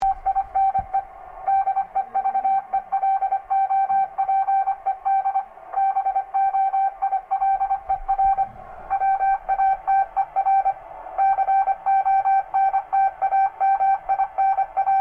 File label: MORSE CODE MESSAGE, Sounds onboard HMAS Castlemaine; Boats; Vehicles